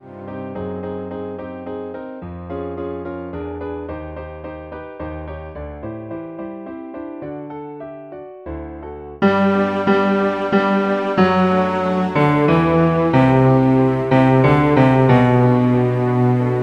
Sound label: fuyugesiki bass